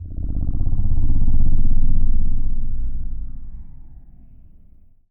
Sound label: Transition Whoosh Flutter; bottlerocket